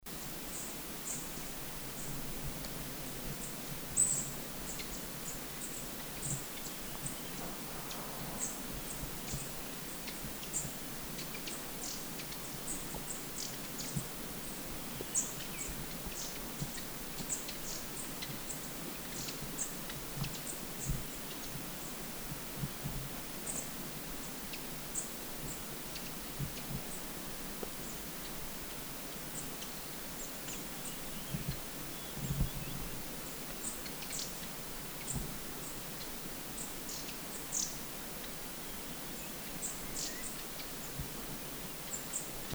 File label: Black Throated Tit Ssp Concinnus Group Call; mesange a tete rousse aegithalos concinnus ssp concinnus; Guangxi Da Yao Shan